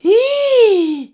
hee, laugh; Voice; ooh ahh

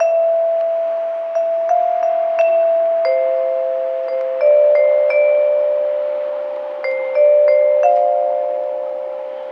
keys, Sad

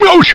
ouch; Voice

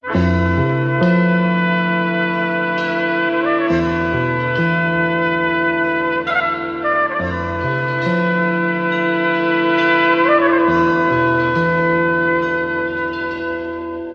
horns, jazz loops

